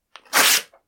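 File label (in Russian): разрыв бумаги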